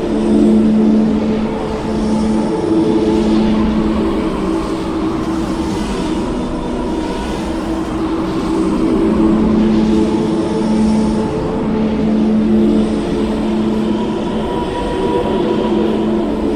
viking shop aura; LoL; emitters map12

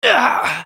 dying; BlindMonk

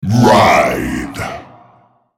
spell cast effort; nasusskin05; Character Voices - English

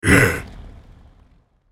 effort; ZombieBrand

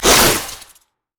crit attack; khazix

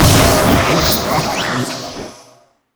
boom; spiritfire; LoL

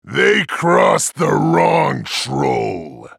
attack; Trundle

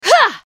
ultimate effort; Akali

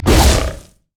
pounce; nidalee base

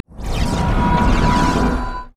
mariner bomb; LoL; fizz skin04

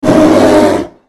spell cast effort; Volibear; Character Voices - English

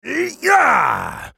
ultimate effort; Draven

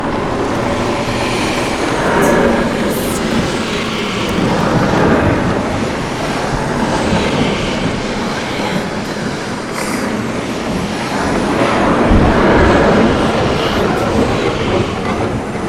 magic tree moans loop

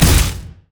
basicattack; maokai

